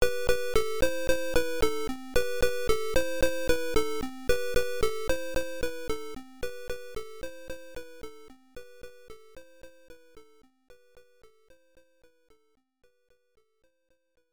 Unused Jingle; Gulkave; MSX

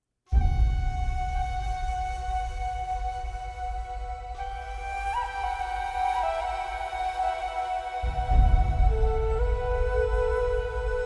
Ruins Exterior; windswept Wastes

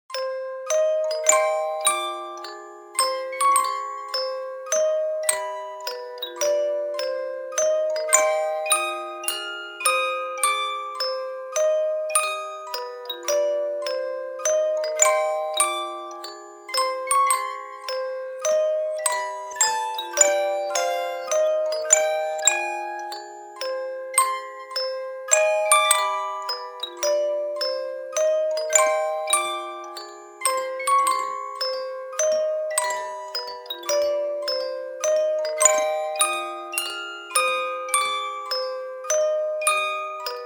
Music Box; Spooky's Jump Scare Mansion

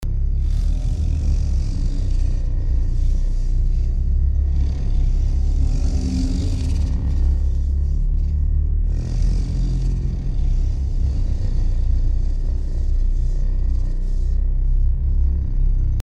Groaning Ambience; SCP: Containment Breach

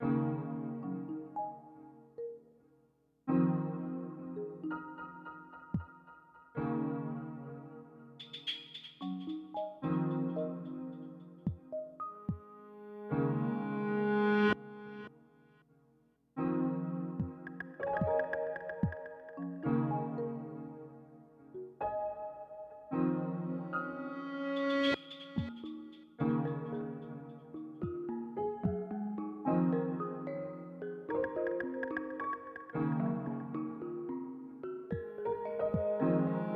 Rainfall; Pikmin 3

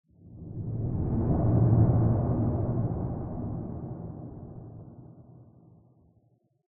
Creepy Effect Wind

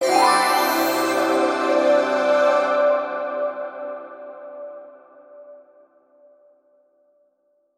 Toilet Flourish

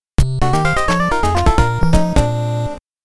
game set BGM; konami famicom chronicle vol.1 disk system edition

